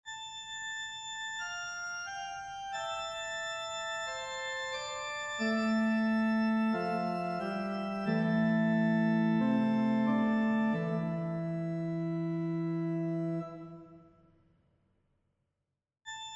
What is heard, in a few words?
fugato